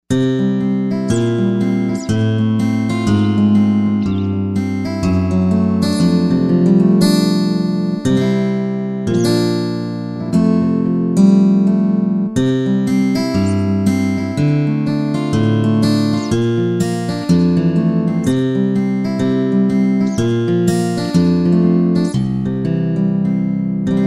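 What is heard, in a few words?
Steel String Guitar, Korg TR-Rack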